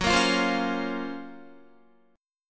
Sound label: F sharp Major Sharp 11th F sharp, Guitar; F#M#11 Chord; strummed; 13,13,17,17,13,13